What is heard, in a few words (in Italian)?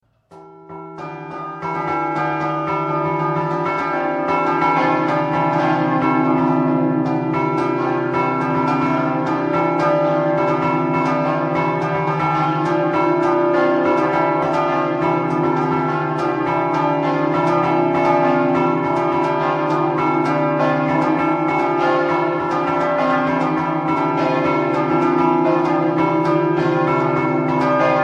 Bagnarola, campane